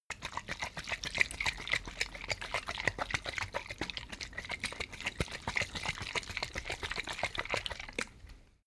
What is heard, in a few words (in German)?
bottle vodka shake, gastronomie